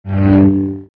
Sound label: saber hup; Sabres Laser